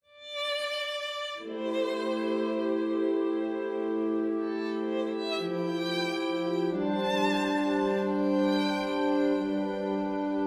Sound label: new drama